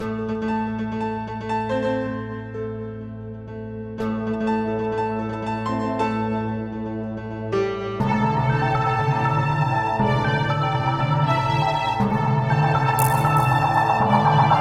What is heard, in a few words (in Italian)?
SINUSOIDE